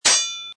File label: sword clank; Casanova